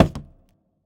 Box Drop; GlobalGameJam-2021

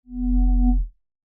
Magnet; GGJ2022; Magnets

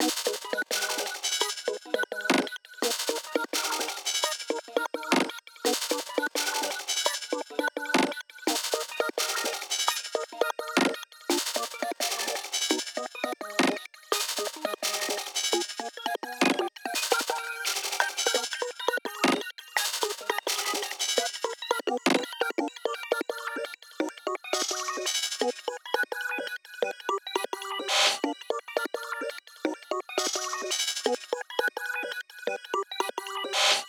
Night theme Glitch; GGJ20

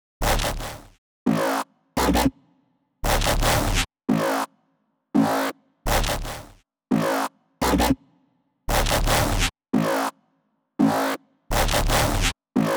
Night theme Basses; GGJ20

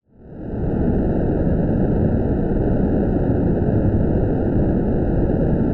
Push; GlobalGameJam-2021

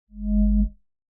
Magnet; GGJ2022; Magnets

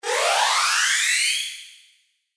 Speed Up; GGJ2022

